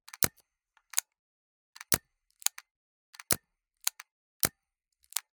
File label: Buttons Switches; soundbits